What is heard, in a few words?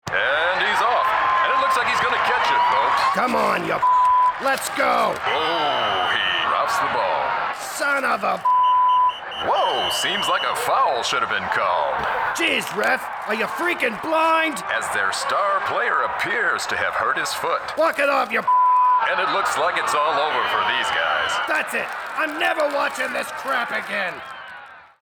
Hallmark dual speaker birthday card; Hallmark Stereo Birthday Sound Card